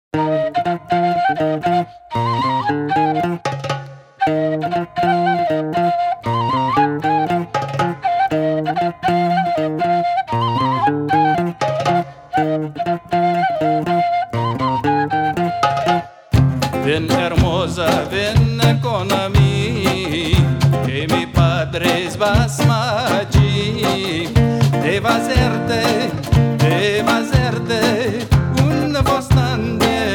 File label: sephardic trio